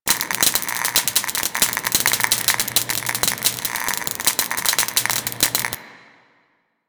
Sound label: Sparks; Warframe